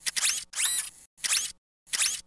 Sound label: Boxing Robot Fall, servos